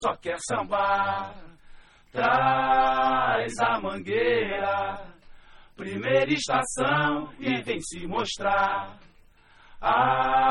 BATUCADA Acapella

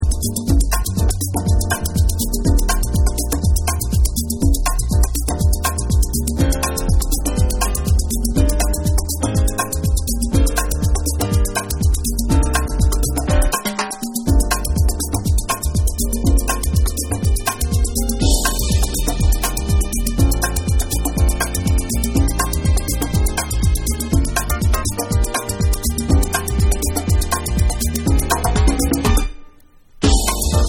Roots Instrumental

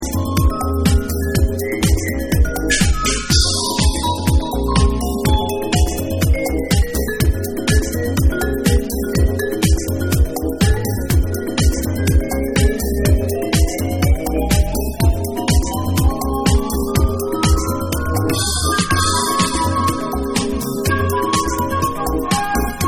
Flute

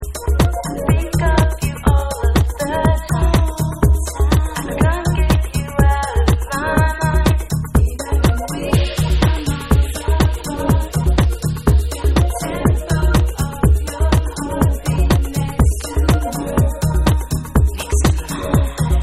Vocal Tribal Mix

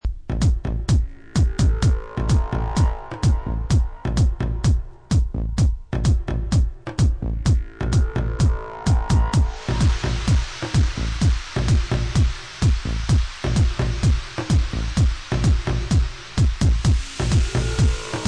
Dark N Dirty Dub

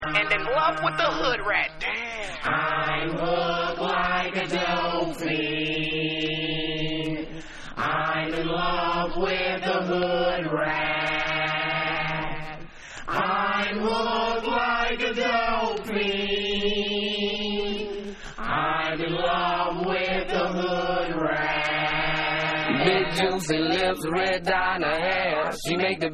Acappella

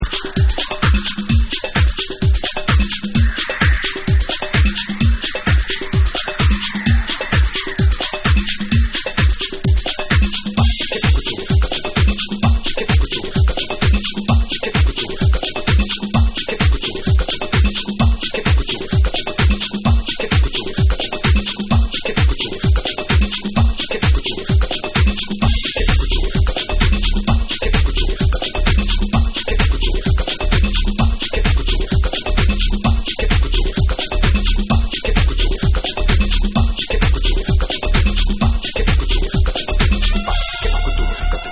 DRUMIN VOICE Dub

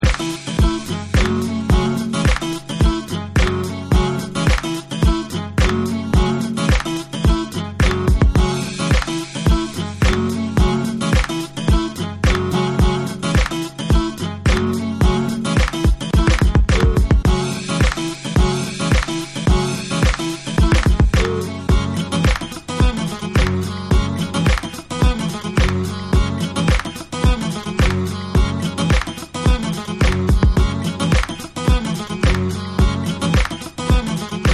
Instrumental

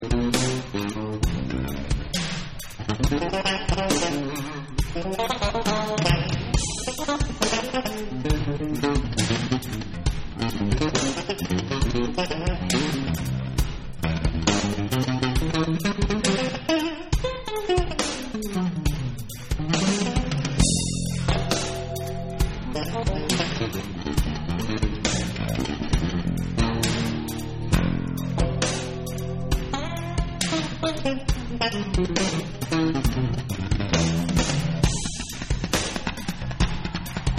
Bass Solo

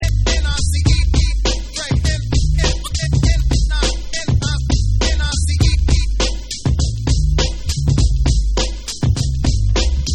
Instrumental Version

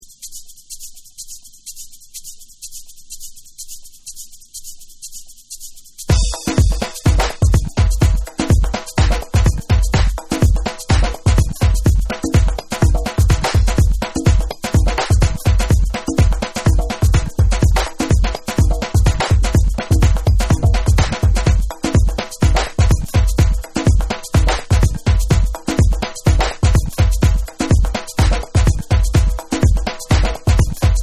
Beats

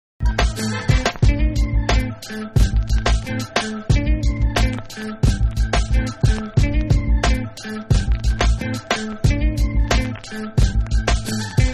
Instrumental

